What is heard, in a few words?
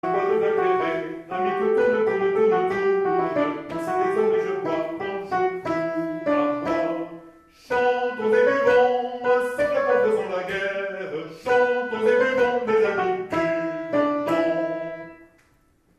Tourdion Soprani